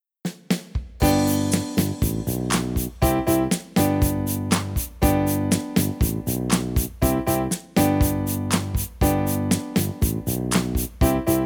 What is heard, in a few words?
Yes I Can Instrumental